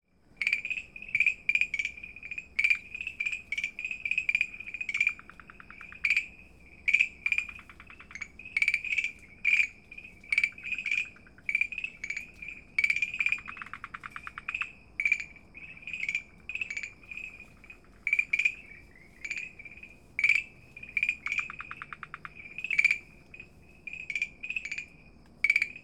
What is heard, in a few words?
farmland treefrog; dalin